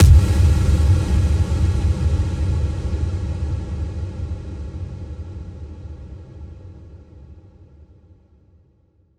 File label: electric explosion; Crash Bang Boom